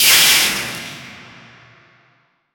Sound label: sharp loud gunshot